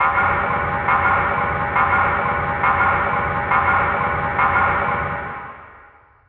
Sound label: citadel 5 sirens; Half Life 2; Episode 1